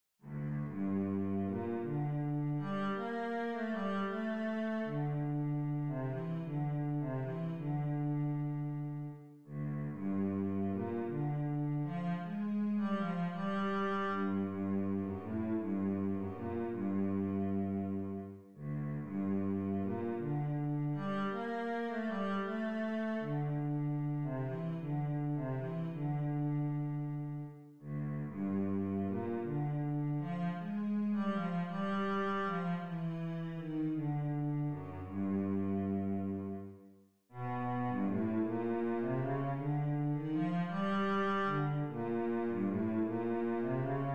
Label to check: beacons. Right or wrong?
Wrong